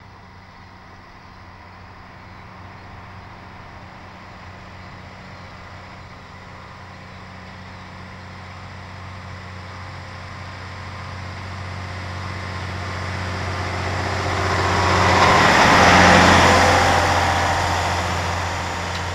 Bus Lorry Drives By Sl

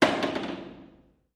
Dropping Seat Lid Down, toilet

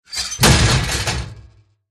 Impact Big Metal Car, car crashes

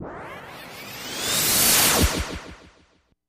Starship Warp Speed Pass By

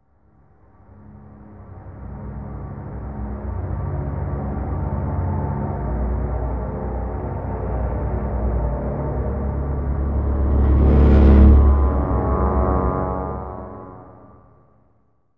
Music Eerie Tonal

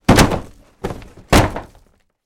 Body Falls On Crate

